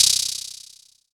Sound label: Loop Clap; Quasimidi Rave-o-lution 309